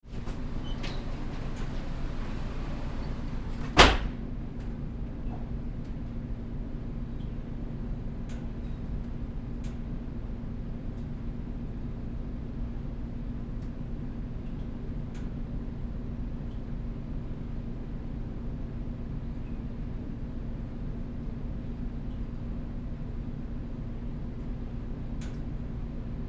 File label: Sauna Sequence, Sauna Room Walk In Pour Water On Coals Sequence; Room Tone; SPORTS; Blastwave